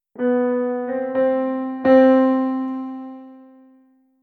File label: create a positive sound t